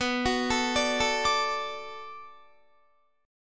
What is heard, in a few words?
B Minor 7th B Guitar, chord; Bm7 arpeggiated